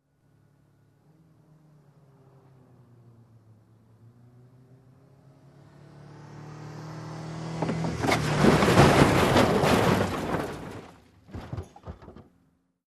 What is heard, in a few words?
Approaching Car Crash